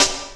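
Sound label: snare drum, Drum & Bass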